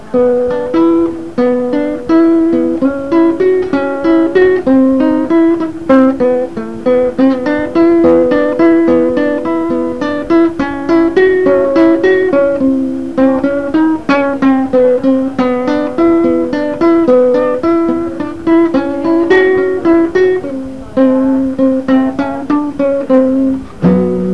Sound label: Blues De Saludo